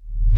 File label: REV Old Kick, Roland JV-2080